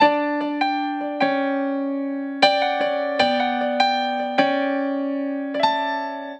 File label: Piano Ballad D